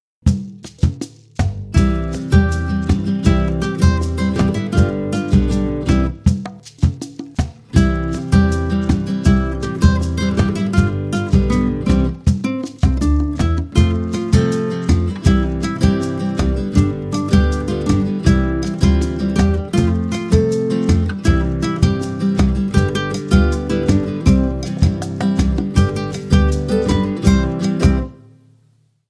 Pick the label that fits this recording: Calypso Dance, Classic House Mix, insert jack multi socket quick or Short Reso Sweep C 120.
Calypso Dance